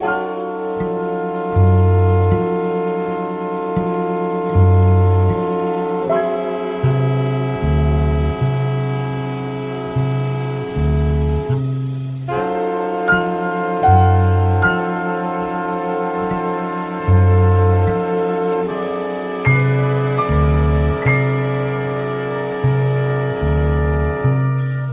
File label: horns and bass and piano, Sad; Loops